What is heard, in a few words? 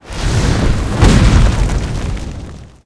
trap meteo attack, monster